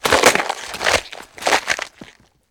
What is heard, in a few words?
Limb and Flesh rip and chewing; RadwinIsland